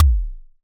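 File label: kick, Casio VZ10M Drums; Polyend; Simulacrum Sounds; 1 Shots; Synths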